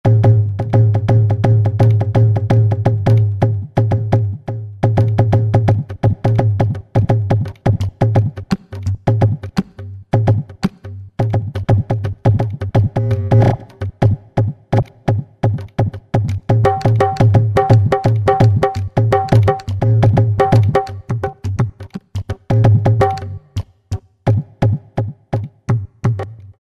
Dyna Dholak, Roland V-Synth; Factory Patches

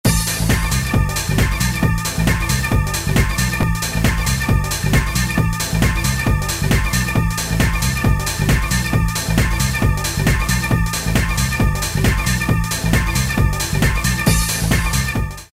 UK Hard House

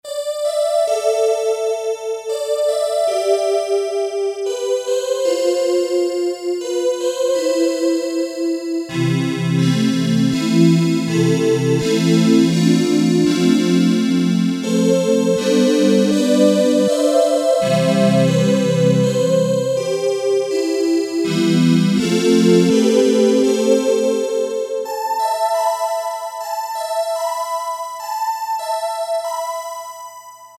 Synth Ensemble + Chorus, Casio CT-370; Tones

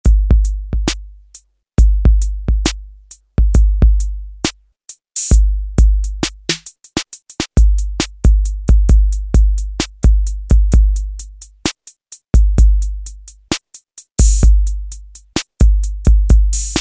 8oh8 Kit

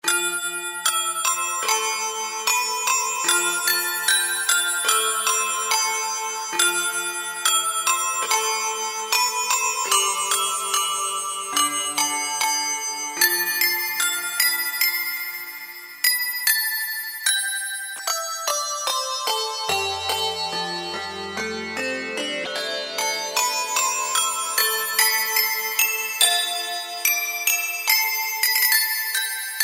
Clockbells, Kurzweil K2000; Kurzweil K2000 V3

